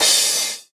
Crash, Cymbals; Vengeance Samples

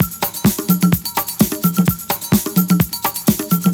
House Beat, 128 BPM

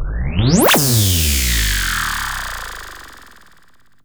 FX Scratching; Vengeance Samples

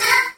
Synths D#; Vengeance Samples

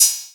Ride, Cymbals; Vengeance Samples; VEH2

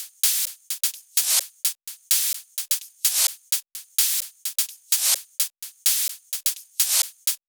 128BPM The Big Groove Kit; Vengeance Samples

